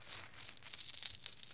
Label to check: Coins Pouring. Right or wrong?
Right